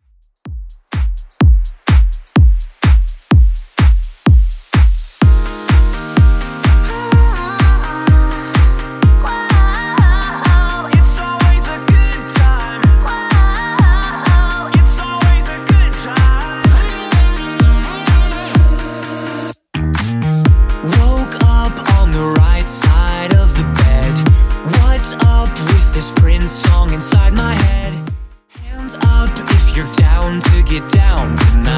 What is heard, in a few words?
Top40, 126bpm